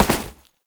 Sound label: Step Snow, Foley; Warframe; Oraxia